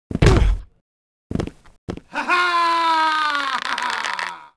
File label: duel outro amb lose; Sid Meiers Pirates; XBOX